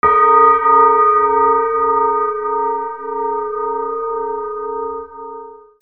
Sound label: BELLS